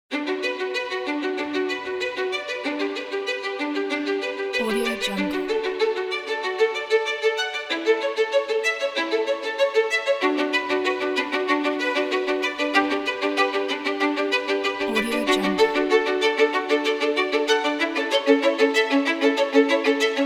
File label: inspiring chamber cinematic violins